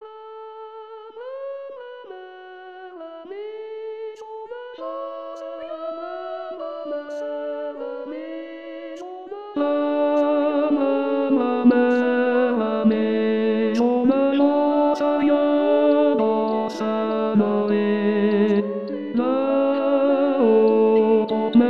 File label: canon tenor, Chorale